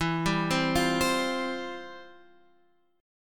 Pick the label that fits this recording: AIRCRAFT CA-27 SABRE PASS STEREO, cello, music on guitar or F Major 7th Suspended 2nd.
F Major 7th Suspended 2nd